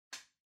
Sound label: Wall Outlet Far Dry Unlock